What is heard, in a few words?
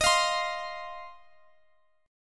C Minor Double Flat 5th Eb